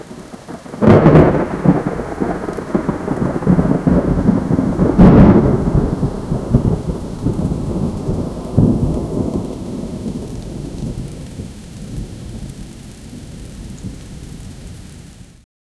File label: a thunderstorm; atmospheric